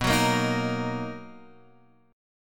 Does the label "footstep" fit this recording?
No